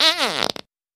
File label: Character Fart, farts